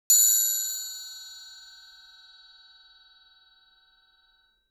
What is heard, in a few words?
triangle; サンプリング素材(パーカッション)